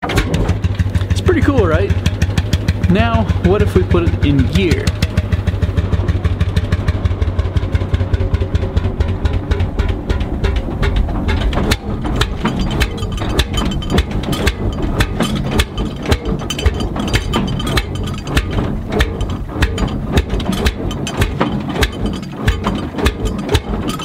Lanz Bulldog Tractor two stroke

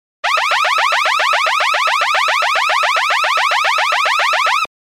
alarm tone

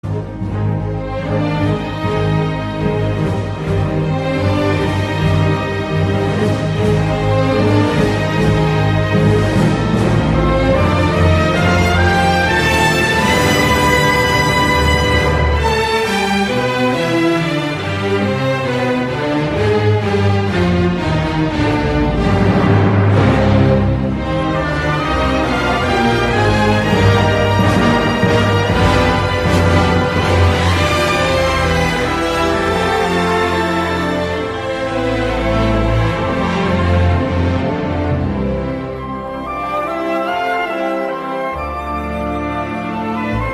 Orchestra

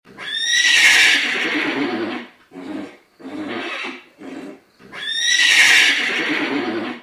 Horse whinny

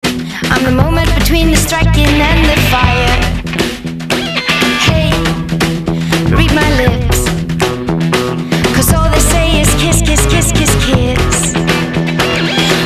Jungle Drum

